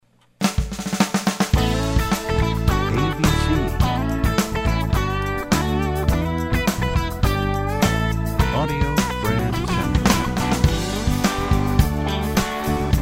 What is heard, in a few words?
American Country, radio jingles